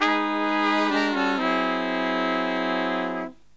Killer Brass Riffs; USB Soundscan vol.29; AKAI

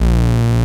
TAURUS; Greytsounds VOL-1

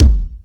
Electro Kick; Yamaha PSR E305

